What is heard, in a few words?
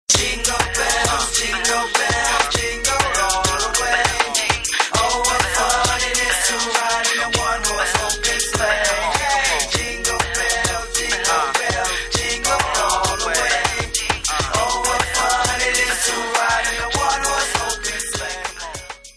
Jingle Bell